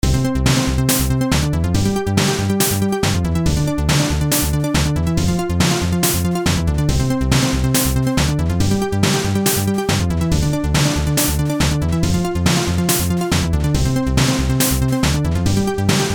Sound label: upwards techno